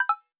Close Or Disable, Modern UI SFX; Slides And Transitions; Cyberleaf; AllOfSound

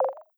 Maximize, Modern UI SFX, Slides And Transitions

